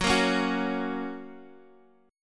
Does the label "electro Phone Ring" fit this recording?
No